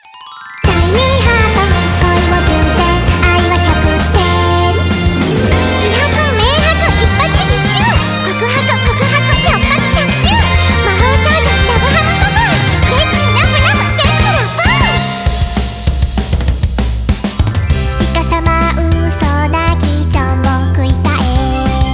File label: BGM Reach